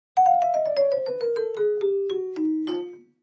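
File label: Clavichord Descending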